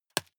juggle catch medium

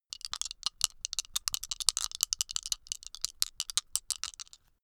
dice shake hands closed long

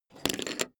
poker chip stack small fall down

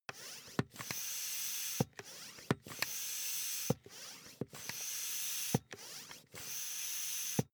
air pump ball sequence

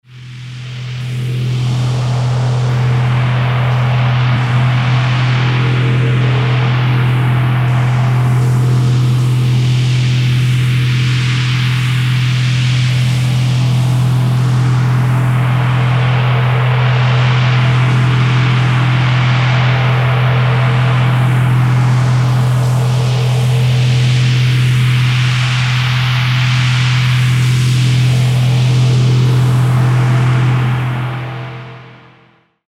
fan

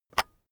keyboard external enter release

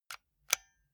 console controller xbox rt press slow

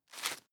tissue take hard

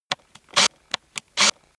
industry machine small

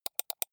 computer mouse left click double soft

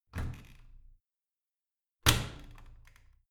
washing machine close hard

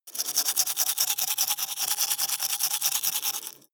teeth brush dry open inward

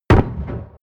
shotgun distant shot

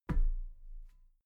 metal ladder step soft muted

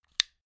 stick lighter button light up

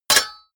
sword block metal

